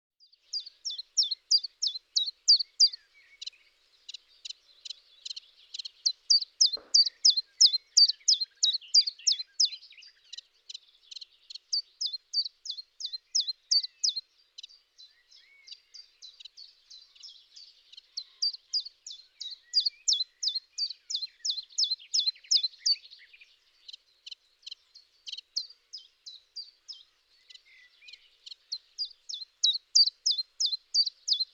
tjiftjaf

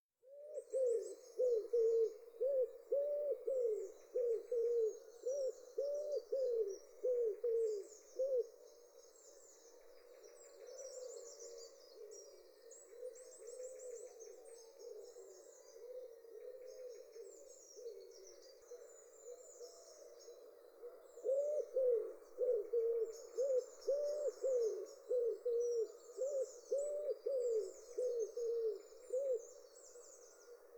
houtduif; David Sluis

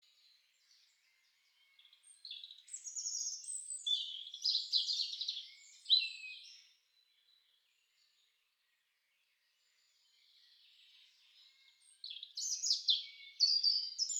roodborst

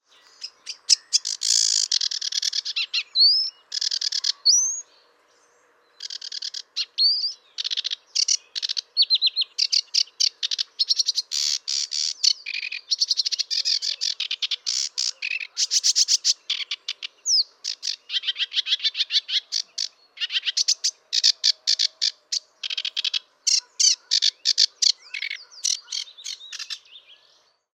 Rietzanger

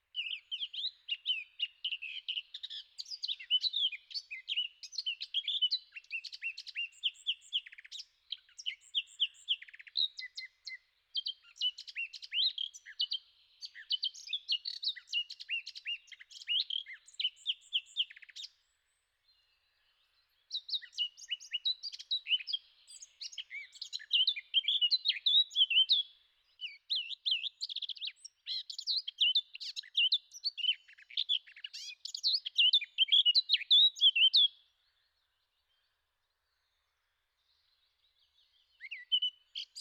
Zwartkop

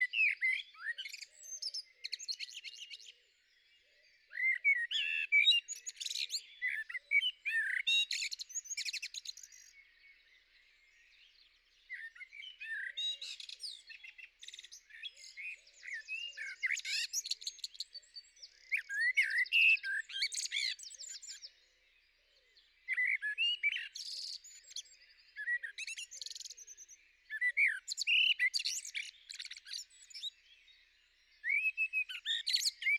Merel

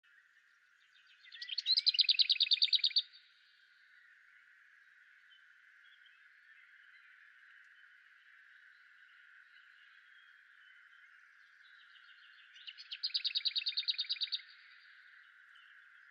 Braamsluiper

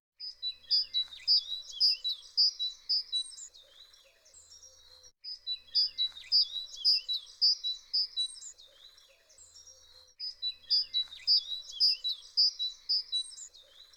Koolmees; Koolmees sietie

